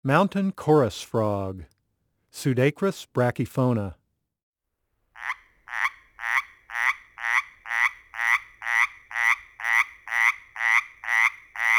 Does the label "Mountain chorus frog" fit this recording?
Yes